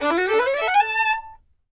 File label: FIDDLE RIFF A, FIDDLE FX; Sampleheads; Whole Lotta Country 1 & 2